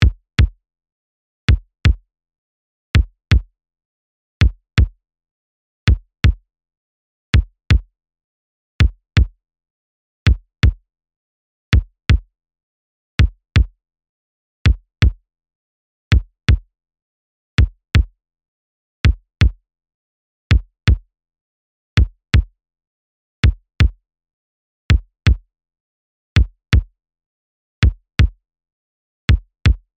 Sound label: Kick707 dry